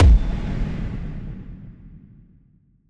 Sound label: Epic Hit; soundscrate